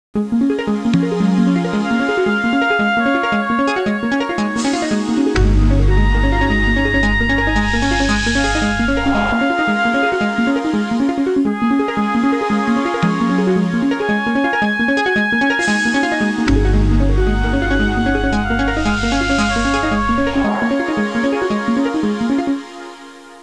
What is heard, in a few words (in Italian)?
musica elettronica